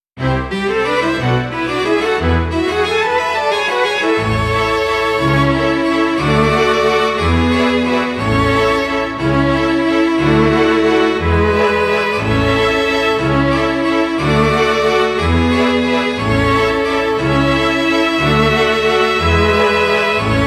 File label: Dance Loop; Happiness Charge Precure! Kawa Run Collection